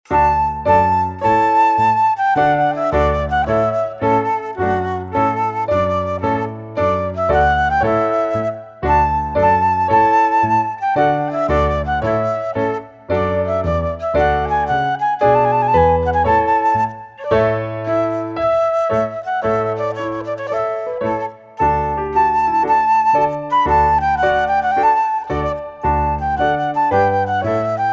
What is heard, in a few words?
Trumpet Tune Piano